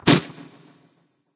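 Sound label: nonverbal clear throat; smite; voicepack; merlin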